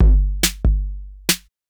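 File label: DRUMLOOP ELECTRO 140; Techno2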